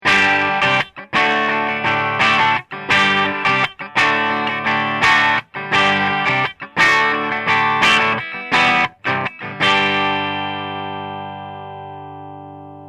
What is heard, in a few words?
6L6 Clean